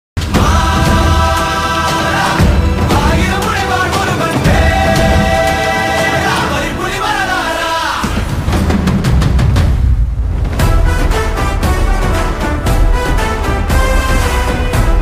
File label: mix bgm